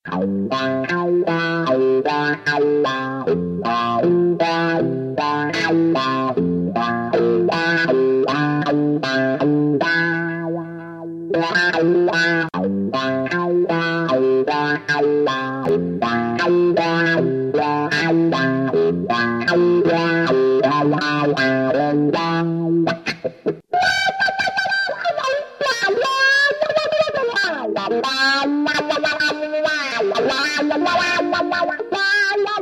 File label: Script Wah True Bypass; Chase Tone